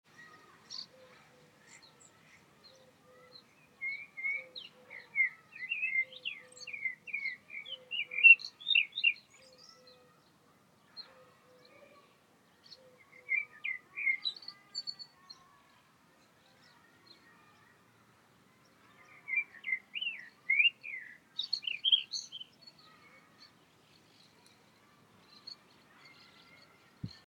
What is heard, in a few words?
Birds sound and children in the background